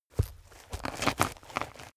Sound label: step forest turn